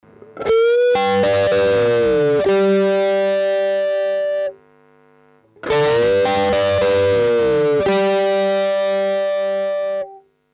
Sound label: Chapman Blues Lick overdriven all positions